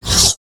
heat seeking missile; LoL; urgot

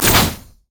spiritrush; LoL; ahri

